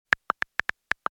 Smartphone texting style short

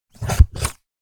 Big rusty scissors cut snap

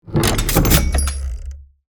Steampunk heavy mechanical movement

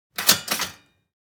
Toaster popping up push down pop up

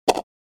Golf ball in the hole

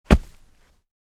Footsteps jumping landing on sand

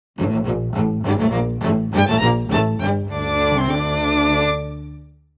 Video game success victory win string

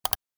Like and subscribe button

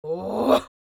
Elderly woman grunt and yell; scream

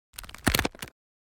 Crab fast movement; Fish and Aquatic

